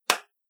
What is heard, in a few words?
Slap Smack Sound; Slap - Smack Sound 1; Slap Sound Effects; combat; Human Body Sound Effect; Andrew Fleming